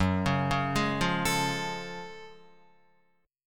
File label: F sharp Minor Major 9th F sharp Guitar; arpeggiated